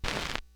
Band Search Old, Vinyl Record Samples, Needle Noises